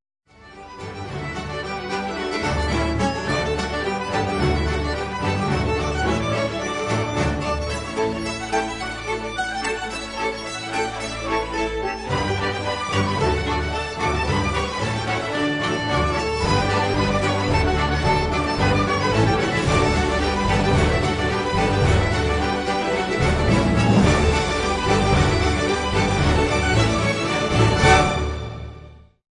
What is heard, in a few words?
Two Hornpipes; Pirates; Dead Man's Chest